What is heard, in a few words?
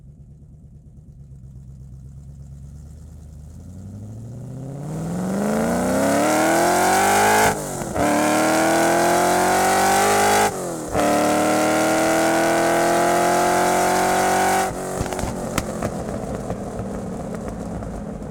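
onboard startup accels decels gearing shutdown exhaust close, De Tomaso Pantera 1972 with BMW Alpina V8; Pole Position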